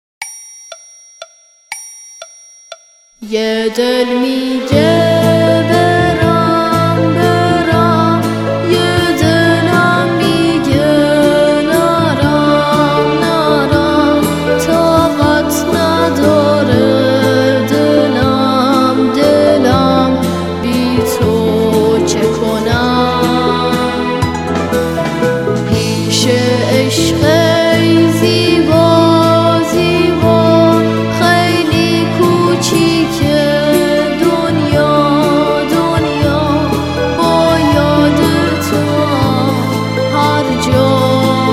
Vocal

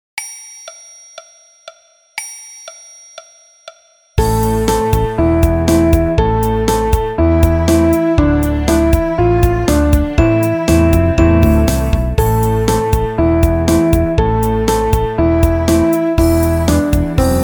Piano Orch

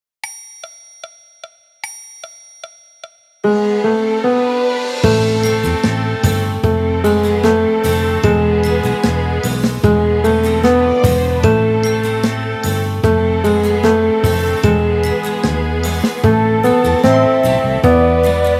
Piano Orch

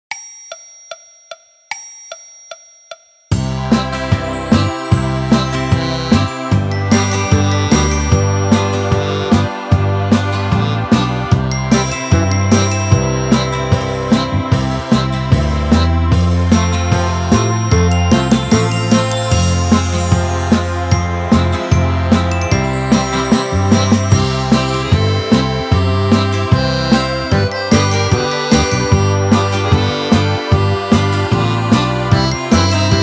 Happy Birthday To You Orchestra Version; تولدت مبارک

